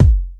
sharp steel kick drum sound g sharp key

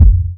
fluffy kick drum single shot g key

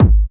tight lows steel kick drum g sharp key

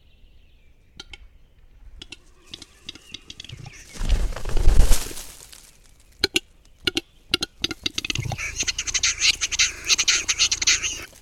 capercaillie male

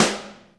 verby snare g sharp key

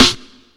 snappy acoustic snare sample f key; snare-drum-samples

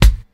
bright kick sample g sharp key

